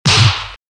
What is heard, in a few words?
kick